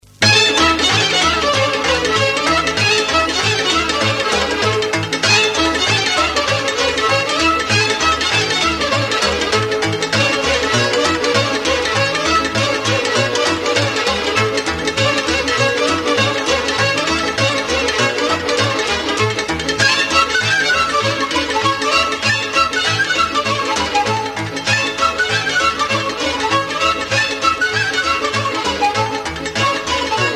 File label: Romania Rata, Folk Dances; Petaluma; 2nd String Folk Dances; Romanian